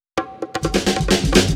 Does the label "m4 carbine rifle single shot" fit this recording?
No